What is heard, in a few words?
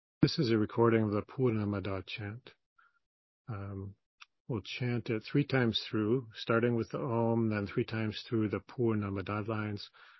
Purnamadah entire chant